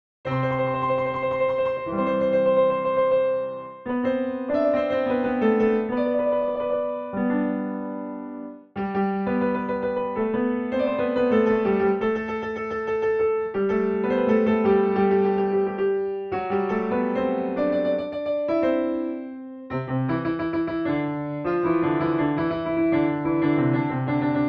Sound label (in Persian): Daramad, piano; Mahur; Radif Mahjoubi